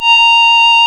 REED A#; Big Fish Audio; Synclav FM

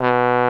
TBONE B2, TROMBONES; Greytsounds VOL-1

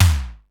tom; Crypton - DrumBase5000; CFZ KIT 2

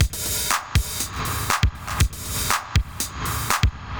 Spring Verb Compressed TR 505 120BPM

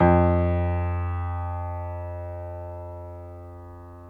PNO XYPNO, Grand Pno, Roland

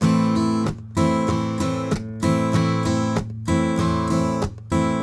RIFF, Blues Guitar Samples; ILIO; Fretworks

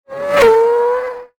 Speedy, cartoon Foley Fx; musicradar; cartoon caper

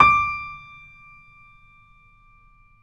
BOSENDORF

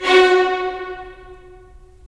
Violins; STR; Violins Sh; Roland - LA Composer vol. 4

